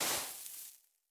刷子刷沙完成声

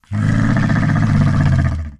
骆驼环境声

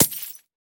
不祥之瓶丢弃声

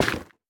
菌岩破坏声

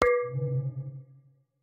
末地传送门放置末影之眼声

